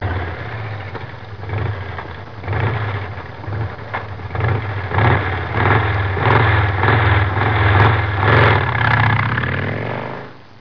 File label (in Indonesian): suara mobil